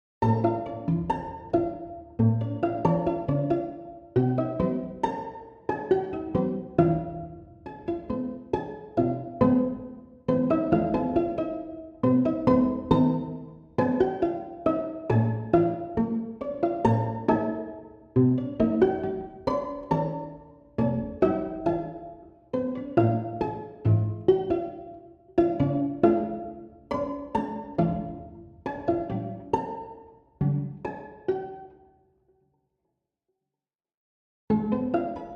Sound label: Pizzicato Only